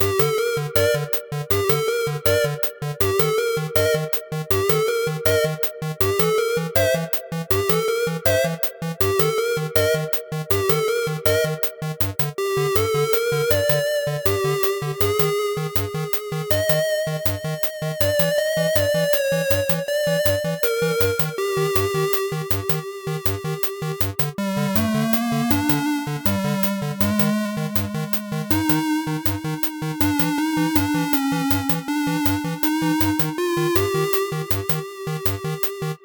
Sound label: dungeon music; roomforchange